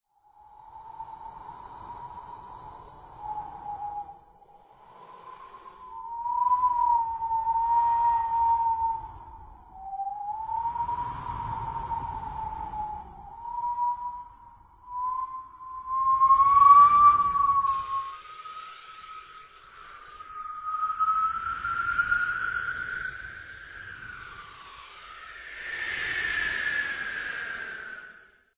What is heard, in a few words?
wind